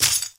steklyannij korotkij zvon

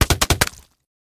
negromkij gluhoj zvuk pulemetnoj ocheredi

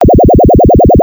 multyashnij puzir igra skill reliz

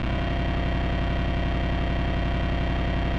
mehanicheskij gul kak u dvigatelya motora

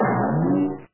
litavri odinochnij voshodyashij gluhoj

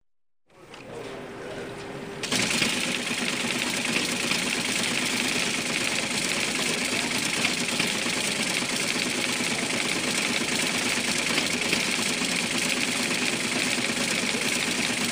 zvuk telegrafa teleks

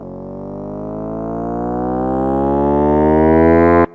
korotkij zvuk razgona gonochnogo avtomobilya

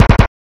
uskorennij zvuk stuka v dver

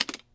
bistroe klacanie po klaviature kompyutera

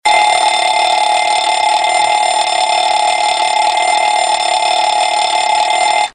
signalnij zvonok

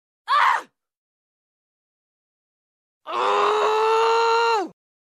zvuki krika richaniya zhenshini

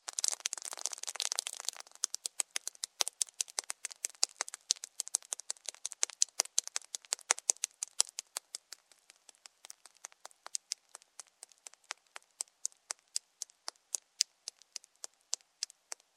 kapli po zemle

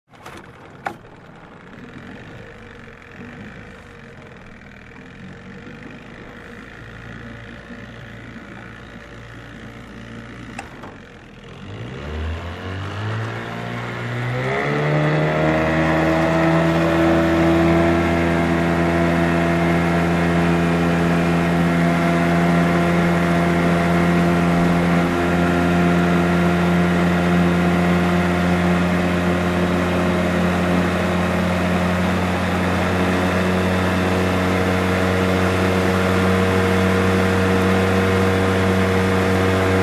motornaya lodka zavoditsya dvizhetsya glushitsya

